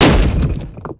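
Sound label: break stone small; Spearhead Demo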